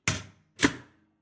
Household Domestic Kitchen Toaster Pushinge Up Toast Lever Up And Released; Kitchen Sound Effects